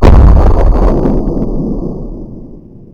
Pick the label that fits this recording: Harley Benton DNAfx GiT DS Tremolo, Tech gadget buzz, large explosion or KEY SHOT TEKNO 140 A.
large explosion